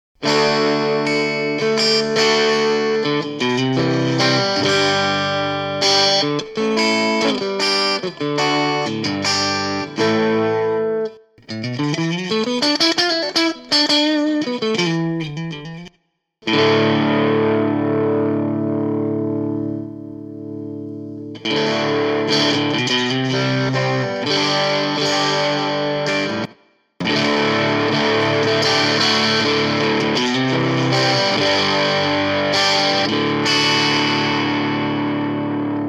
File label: Vox EXP, pedals